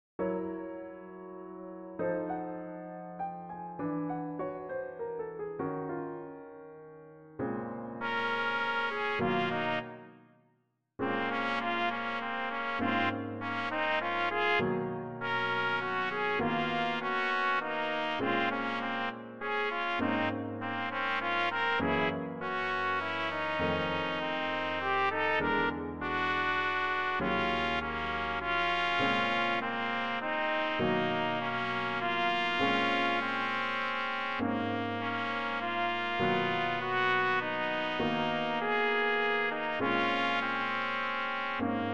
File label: blues